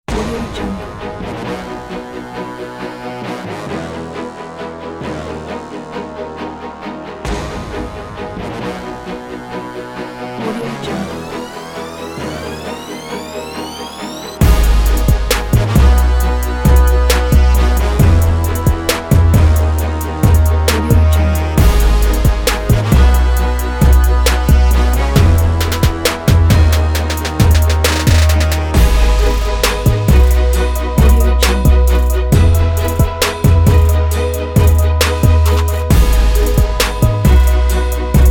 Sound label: epic trap hiphop; audiolibrary